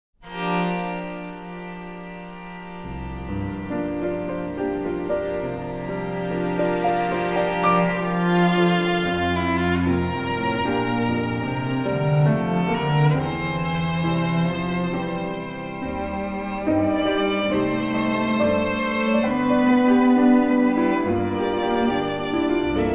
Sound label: Serenade For Piano Trio